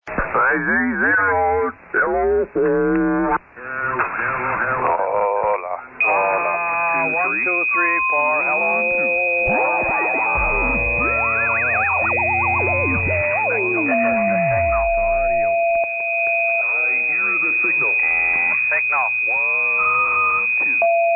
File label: SSB Net Tune Up